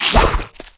mongoos attack, monster

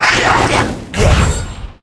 phantom captain attack

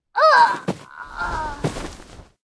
dark assassin dead, monster

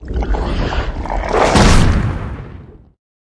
swamp giant attack, monster

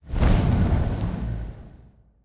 Boss Owl Bear main

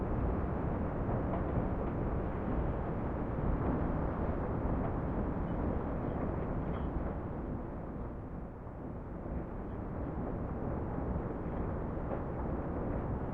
corridor, undeadtower

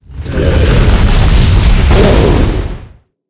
tree boss attack, monster

